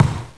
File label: BOP